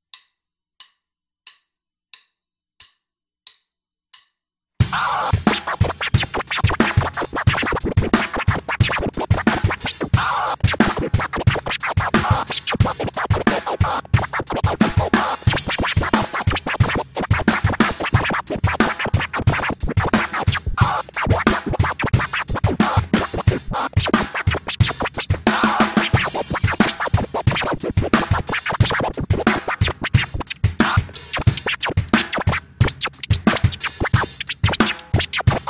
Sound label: scatching over drums freestyle